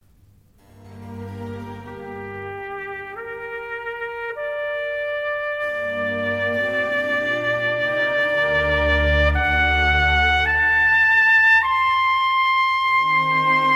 Trumpet Concerto in D Minor, Adagio; HWV 367a; IV. Adagio; Baroque Trumpet Concertos

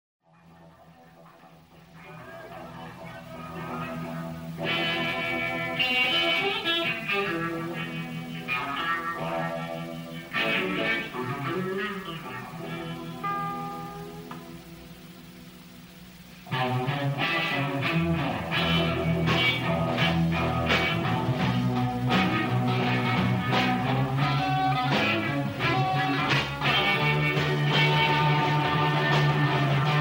Instrumental

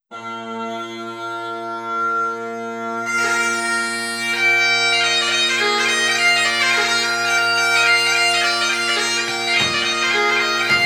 strings hornpipe; liverpool

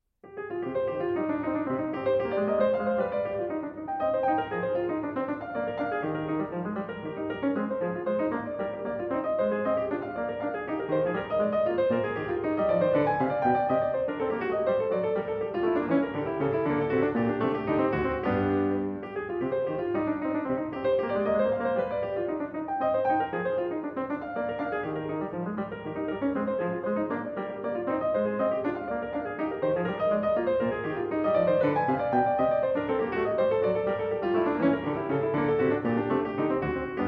French Suite No.2 in C Minor, Courante; BWV 813; Vladimir Ashkenazy; Bach French Suites, BWV 812-817

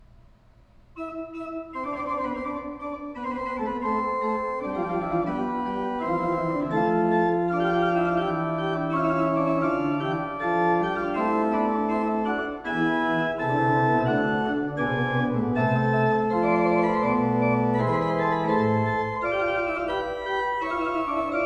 Fugue, Organ; Magnificat quarti toni; Johann Pachelbel; Complete Organ Works

